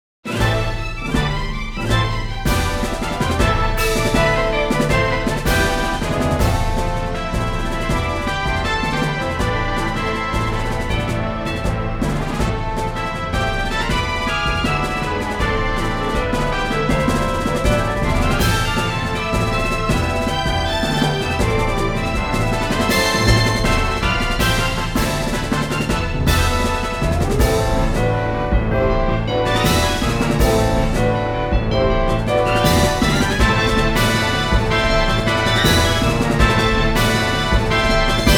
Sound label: music quest boss; legend quest ostai0220 android game music